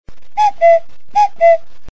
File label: cuckoo sms ringtone